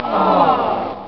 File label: crash; mechamoid